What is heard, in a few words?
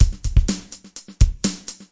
125 bpm, general midi break